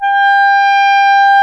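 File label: wind; Roland - LA Composer vol. 3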